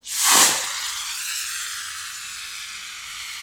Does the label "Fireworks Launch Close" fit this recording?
Yes